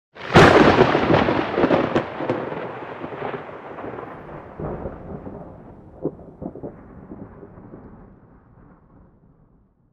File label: very close, nature; Atmospherics